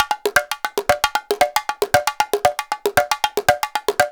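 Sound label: emelako grooves; Best Service; World Colours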